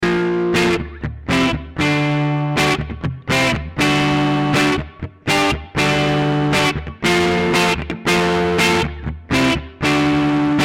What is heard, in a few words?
Mooer GE 300